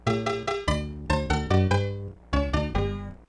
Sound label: game over; smas-smb3